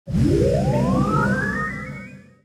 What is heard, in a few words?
wizardry